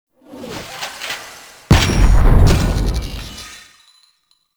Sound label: Title Screen SFX; Warframe; Caliber Chicks Minigame; Title Menu